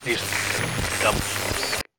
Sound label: radio cutoff; Counter Strike Model Pack; CS:GO